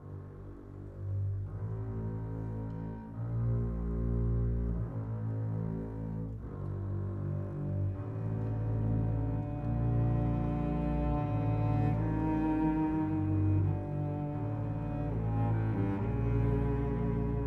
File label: Cinematic, Orchestral Series; Sonic Foundry (Sony Creative Software); Orchestral Series VOL-3 - Cinematic